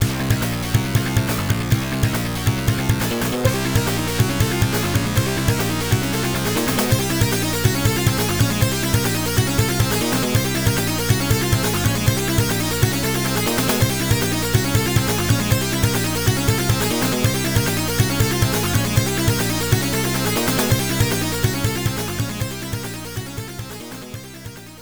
Win Music; Toughman Contest; Genesis

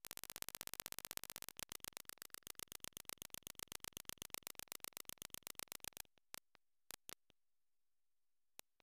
Race Start

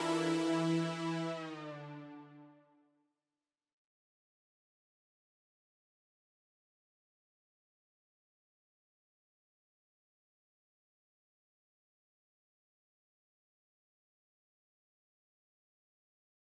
Frantic Mix Loop Stem Layer 2

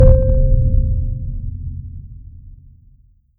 Arrow Ding; backrooms 2d android ios online windows gamerip

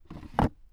Drawer Close Fast; this is the zodiac speaking 2020 gamerip switch ps4 xbox one pc macos

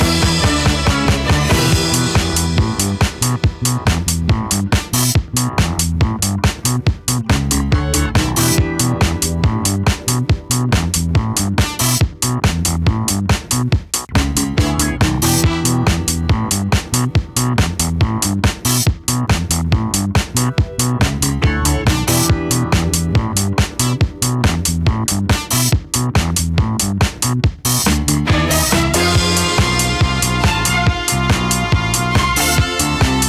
Disco; Disco Volcano; Taz Wanted soundtrack

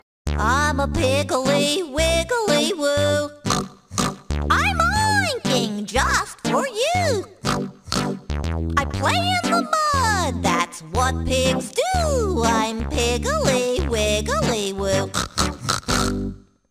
Pig Song

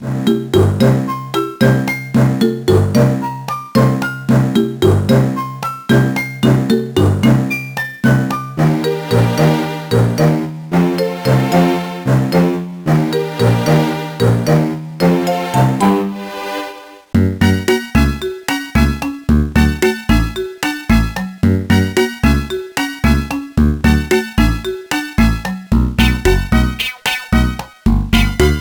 Casual Cheery; madagascar operation penguin; gba

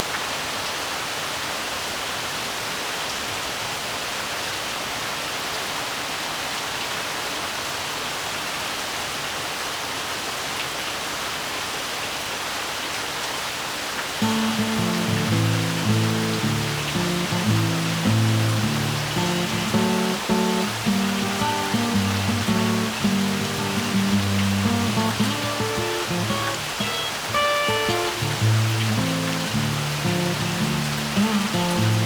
Rain Heavy; barnyard; playstation 2

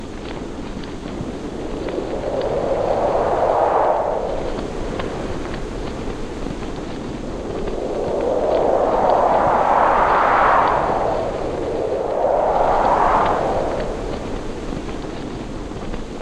wind ambience

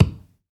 Tree trunk Collision

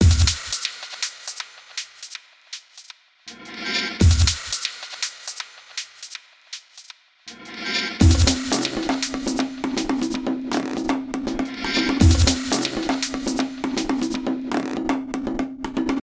perc interlude 120bpm 32beats; chicory a colorful tale; ps4

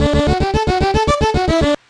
Jingle Race Victory

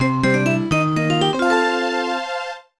Jingle; Happy Cooking; NDS

